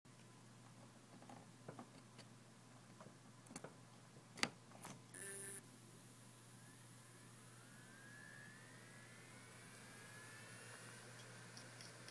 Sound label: WD5000AAKS, damaged hard drive sounds